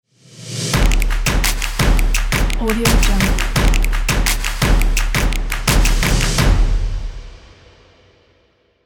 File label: rhythmic opening ident